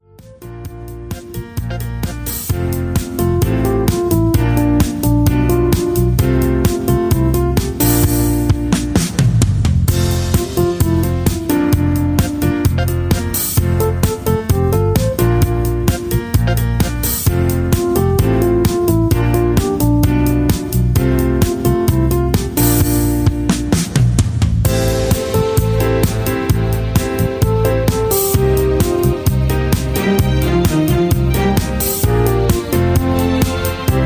Instrumental with BGVs

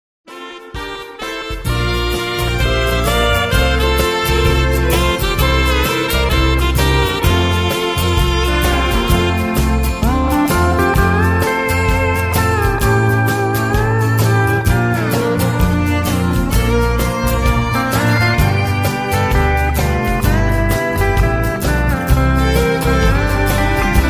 Two Step Instrumental

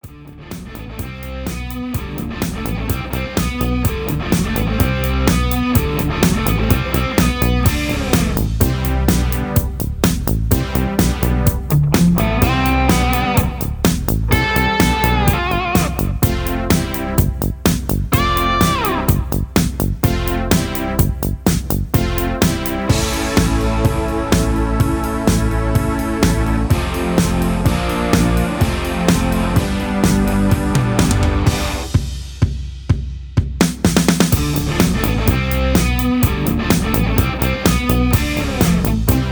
Instrumental NO Leads

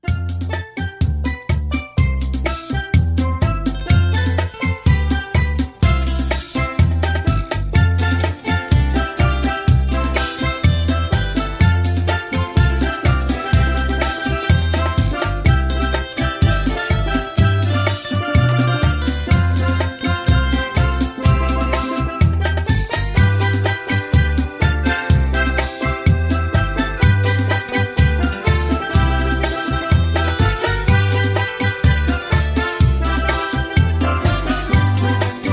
Instrumental

